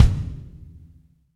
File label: XV Kick, Roland XV-5080